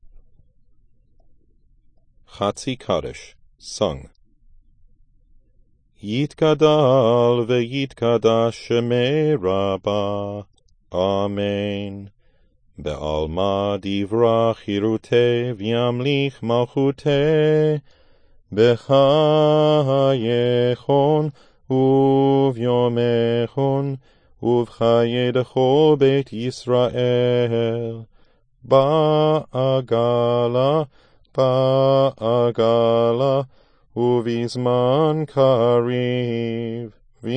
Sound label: Chatzi Kaddish sung